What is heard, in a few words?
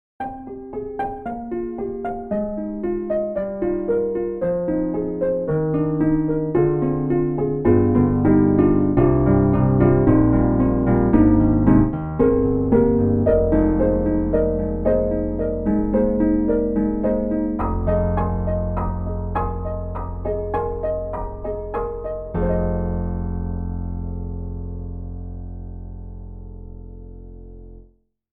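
Piano Broken Piano Antique Piano; AntiquePianoII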